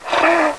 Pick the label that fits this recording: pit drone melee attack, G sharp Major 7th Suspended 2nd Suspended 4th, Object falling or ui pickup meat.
pit drone melee attack